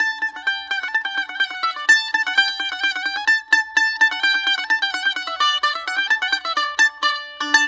BAGLAM D, Mediteranean

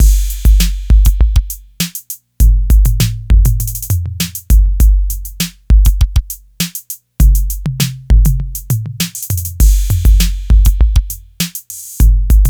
Beat, Hip-Hop

